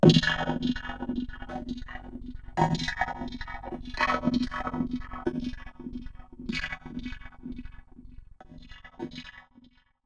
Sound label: Ring Mod, Cymbal, contact mic; Murf & Ring Mod